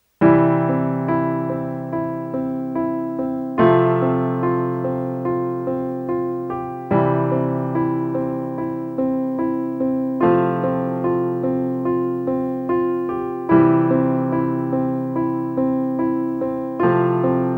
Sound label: solo piano